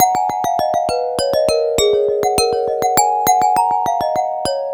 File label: Fast Glass